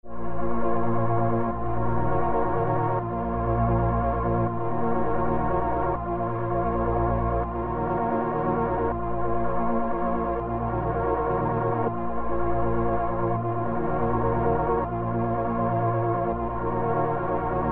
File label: Melody Loop Amin 162 BPM Pad